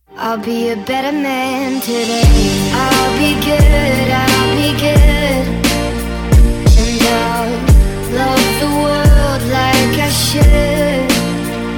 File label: Acapella